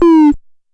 Drop Lo, Stylophone Beatbox

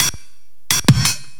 LOOP, 170 BPM

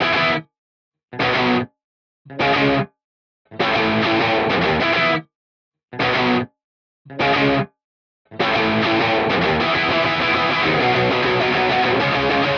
Guitar Loop punk, alternative; punkbones; 99Sounds x Monosounds; Instrument Loops; Guitars

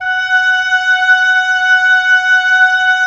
Oboe f; Roland - LA Composer vol. 4

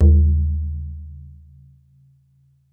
BODHRAN, Celtic Percussion

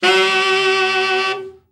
BRA TENOR OB, SAX; Best Service Gigapack 1; BR SAX 4

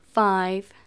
Female vocals and robo-vox

